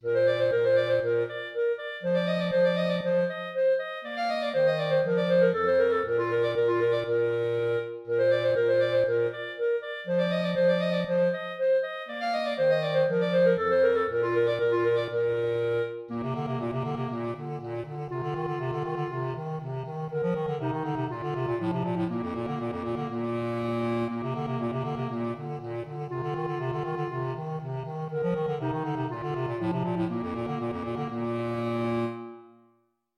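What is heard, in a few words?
allegro clarinet bass clarinet duet; haessler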